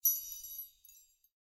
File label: Snow; Blind Quest - The Frost Demon